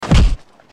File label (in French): Coup de poing